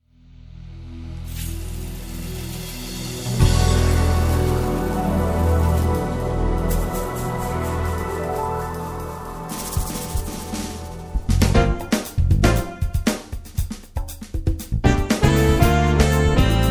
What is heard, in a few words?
Smooth jazz